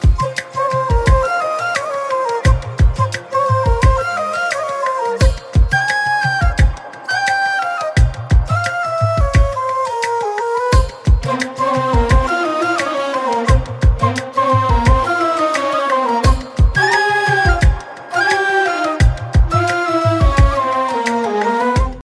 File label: Dragon Flute BGM